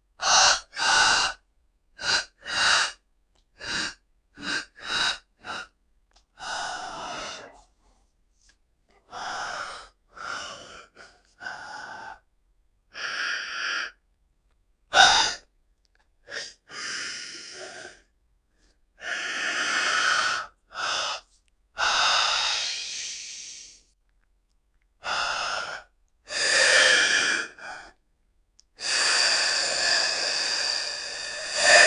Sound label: masturbation, sexual